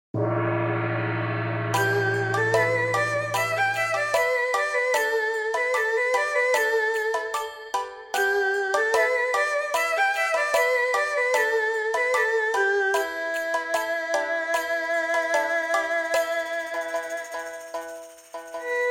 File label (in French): Erhu, instruments traditionnels chinois; mini erhu; dizi